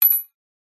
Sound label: industrial metal washer drop or set down on concrete paver